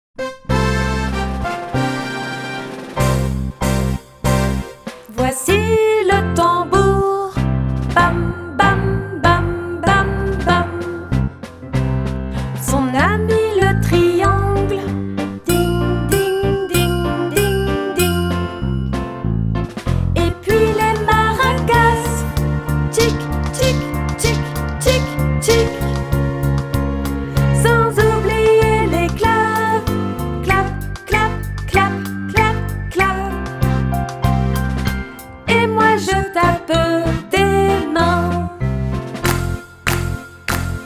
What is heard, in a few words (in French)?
quel orchestre, comptines